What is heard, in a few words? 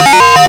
alarm, FX & ATMO, ALARMS & ALERTS